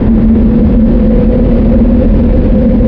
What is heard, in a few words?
eerie wind; PC Gamer IT CD 41; Daikatana